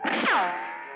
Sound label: ricochet